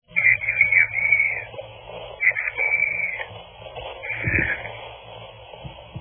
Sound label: Transmission interception